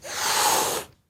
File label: hiss